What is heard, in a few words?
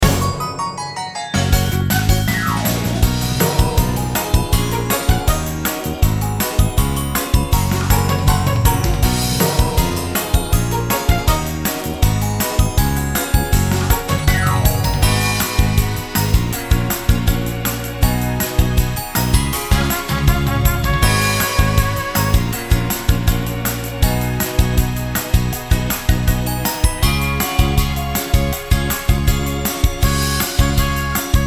French Pop, BGM